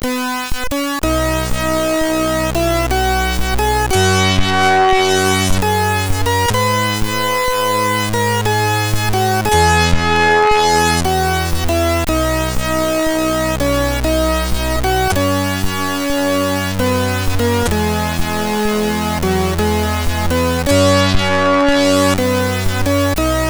sid, MOS6581R2

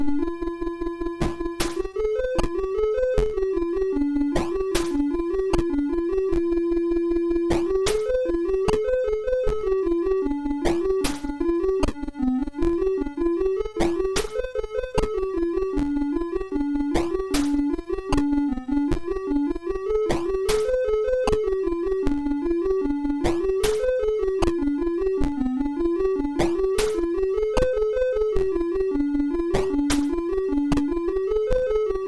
sid MOS6581R3; Games Summer Edition menus

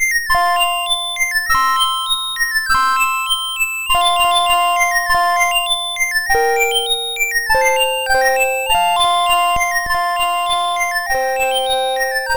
Dancing Bells, MOS6581; Syl

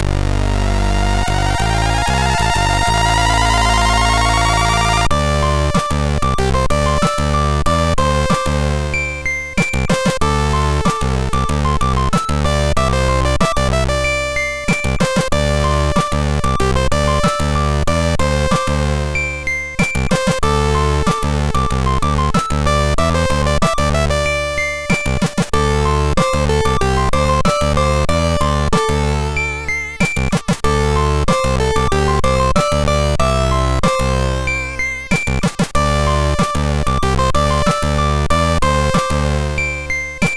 sid MOS6581R3

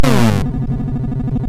Die Alien Slime, MOS6581R2

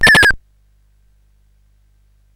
sid CSG8580R5

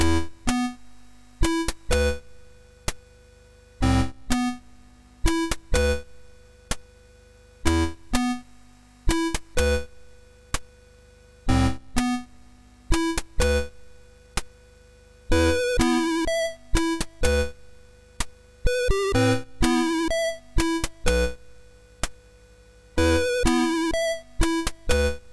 MOS6581R3, sid; Night Knight

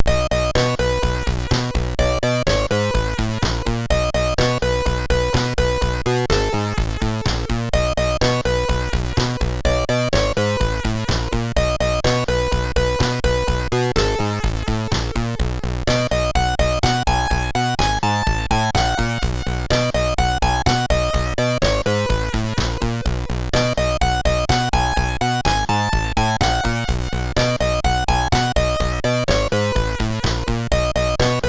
Lights On, MOS6581R3

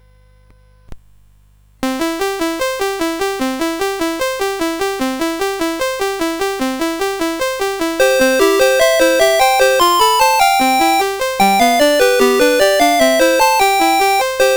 CSG8580; Vince Bonina; CSG8580R5

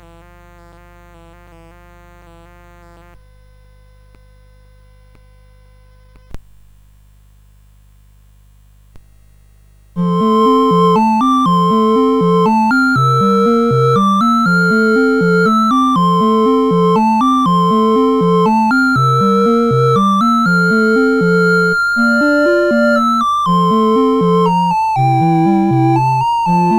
CSG8580; CSG8580R5

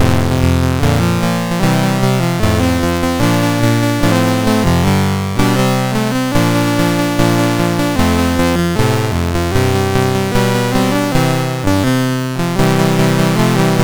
sid MOS6581R2; Fall Guy

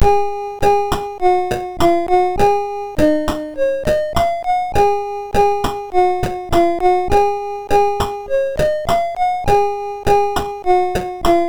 8-bit Ending Theme, MOS6581R4; 8-bit Panda

